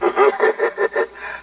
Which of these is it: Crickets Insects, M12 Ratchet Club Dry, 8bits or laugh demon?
laugh demon